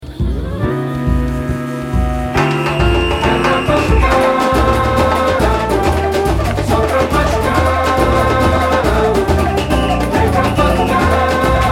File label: batucada jazz